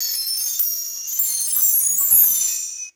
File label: bright metallic chime ring; bells